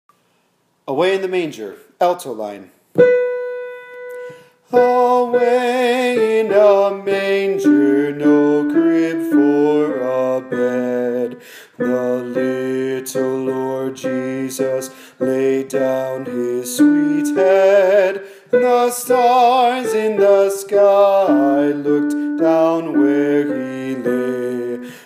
alto away in the manger

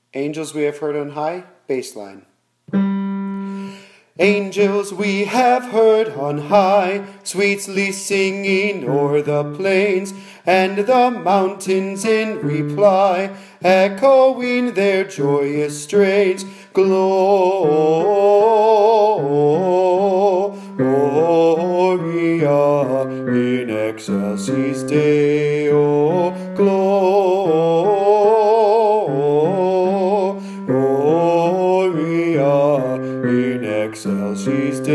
bass angles we have heard on high

